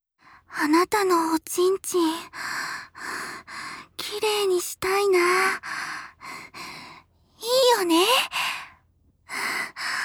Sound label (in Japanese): お姉さんのお掃除フェラ, ASMR